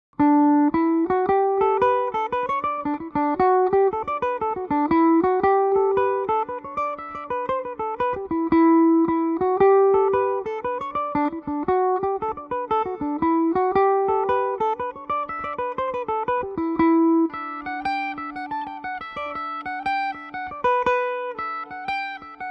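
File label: E minor, irish mandolin; lanigans ball; belmuse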